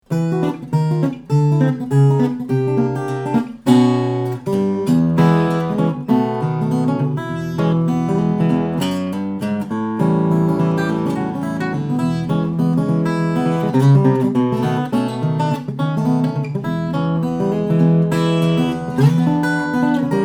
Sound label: steel-string guitar, flattop; journey instruments of410